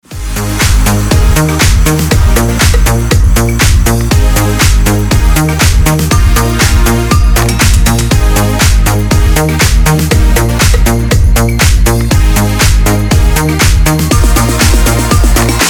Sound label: club mix